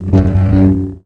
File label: saber; DitexManager